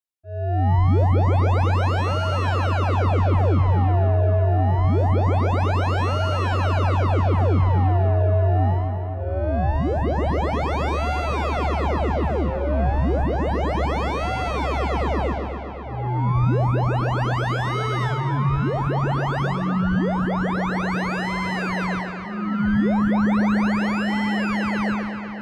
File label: aliens sci-fi sequence